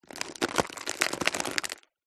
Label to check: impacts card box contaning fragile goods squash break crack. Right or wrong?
Right